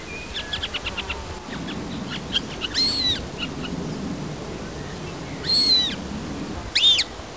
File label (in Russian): lugovoy lun golos